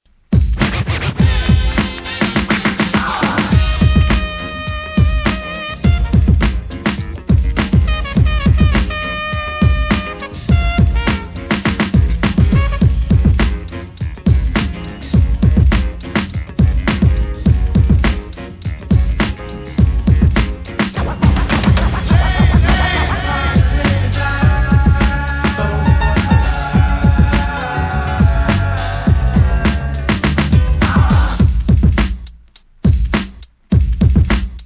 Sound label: jazz